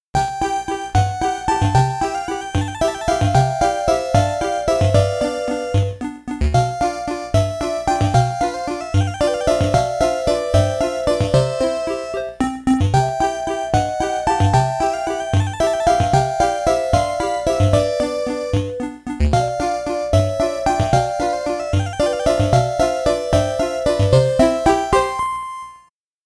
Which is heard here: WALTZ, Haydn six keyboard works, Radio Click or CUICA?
WALTZ